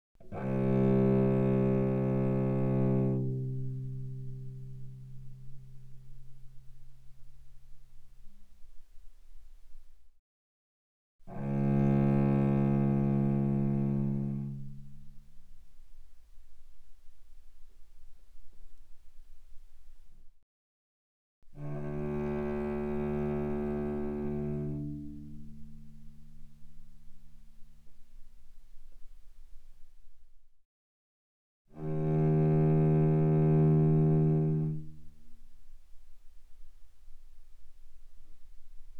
Cello arco mf sul C C2 B2; Strings

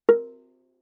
Viola pizz ff sul G Bb4; MIS Pitches - 2014; Strings

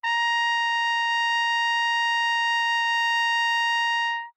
Trumpet novib ff Bb5 stereo, Bb Trumpet; MIS Pitches - 2014; Brass

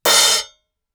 crash choke crash, Suspended Cymbals; Percussion

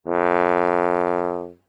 Tuba ff F2; Brass

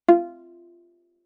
Viola pizz ff sul D F4; MIS Pitches - 2014; Strings

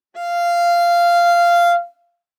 Viola arco ff sul A F5 stereo; MIS Pitches - 2014; Strings

